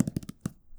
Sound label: Hardcover Taps, Books; bb - Books, Paper, Writing